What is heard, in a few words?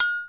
bell, Alesis HR16 drum machine; DRUM MACHINES